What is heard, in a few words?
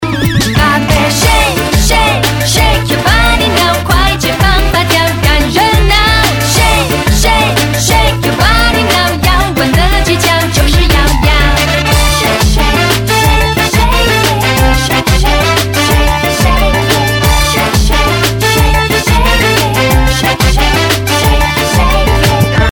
shake